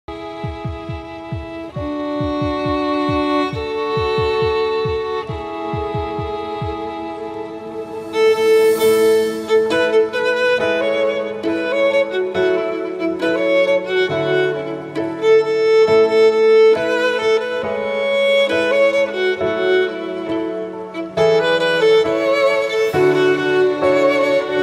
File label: Wedding Violin